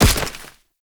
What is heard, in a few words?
Roll To Feet; Archwing Deploy; Warframe; Cinematics; Rail Jack